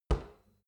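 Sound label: step soft stomping, footsteps; schritte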